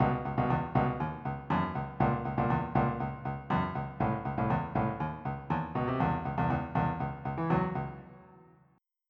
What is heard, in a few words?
Funk